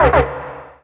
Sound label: alert negative; Stellaris